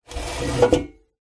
household stool chair movement slide scrape tiled floor